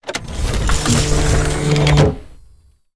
police car unspawn; Original TK Sounds

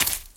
rocks step; Golden Crayon Sounds

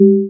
Stack Shot; iOS Sounds

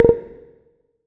listbox, ChromeVox Earcons Reverb

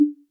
line editor click; Golden Crayon Sounds

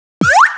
Folder Opened; Bop It Sounds

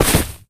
hay fall; Golden Crayon Sounds

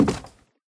wood step; Golden Crayon Sounds

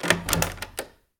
door open; Golden Crayon Sounds

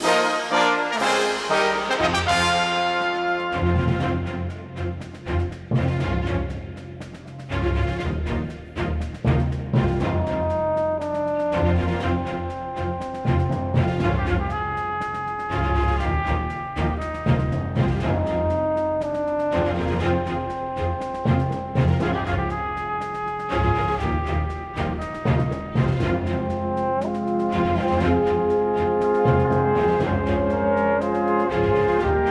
battle victory, music; Manamon Music; Manamon 1

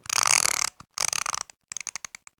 toy; Golden Crayon Sounds

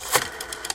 rotary phone; RS Games Sounds; Monopoly

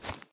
grass step; Golden Crayon Sounds

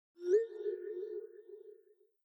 Echo Sound Effect sent; iOS Sounds

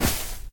grass land; Golden Crayon Sounds

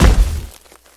concrete hard land; Original TK Sounds

